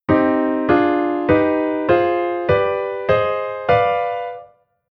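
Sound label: Chord Diatonic Minor Harmonic Minor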